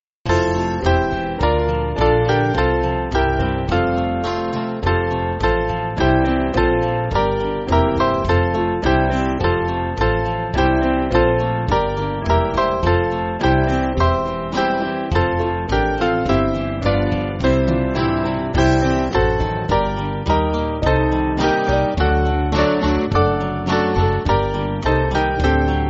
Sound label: Band, church music